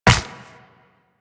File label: Run Ice, Footsteps; Warframe; Tenno